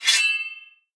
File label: minion battlefoley swordscrape; smite; npc; roman archer